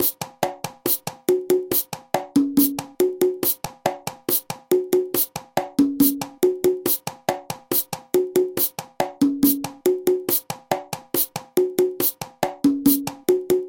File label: son montuno conga